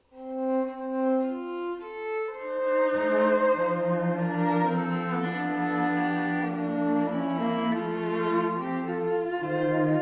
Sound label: trio